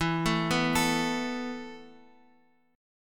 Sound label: E Suspended 4th E, down, Guitar, Open F; Esus4 arpeggiated